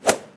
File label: split; RS Games Sounds; Blackjack